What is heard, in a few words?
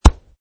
Chest Punch; Martial arts - chest punch - Variation 7; Punches and Hits; Combat; Michael Huang